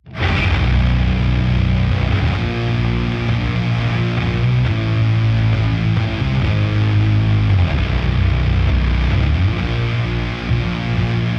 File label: Metal Hell Main Ambient; Nekravol